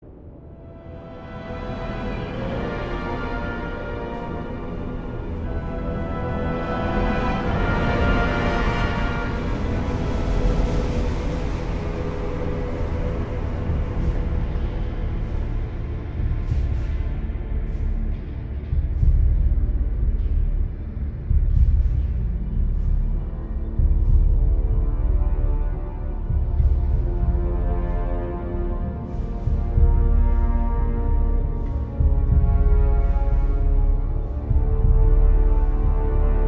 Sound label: Music Ambience; Hellfire Peninsula; World of Warcraft